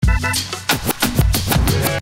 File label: Hiphop email alert